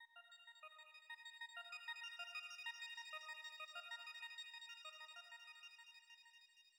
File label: Calm